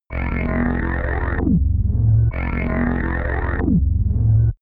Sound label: inside alien ship laser blast